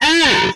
Hit, Vocal; retro arcade; MMRetroArcadeSoundsPack

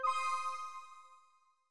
confirm, tones; inteface; inteface sfx pack 1

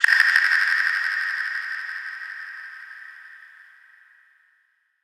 Delay Reveal; FREE SFX PACK VOL 3

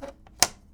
Open Close, paper cutter; Micro Pack

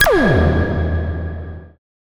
Fail Game; FREE SFX PACK VOL 1

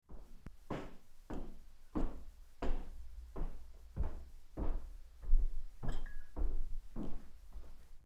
Wooden Foosteps; horror sfx vol 2

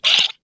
bug, creature

